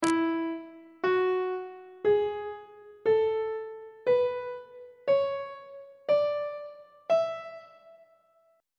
f flat mixolydian mode on treble clef

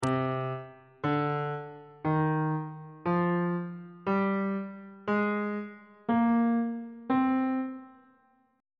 c aeolian mode note positions on bass clef

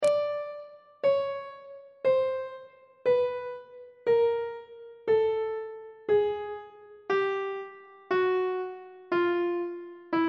d sharp chromatic scale descending on treble clef

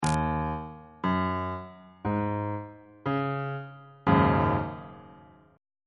a flat dominant 7th suspended 4th 2nd inversion

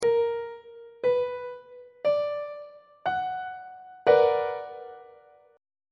b minor major 7th 3rd inversion on treble clef

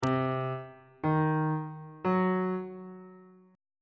b sharp diminished; a sharp minor chord 2 is

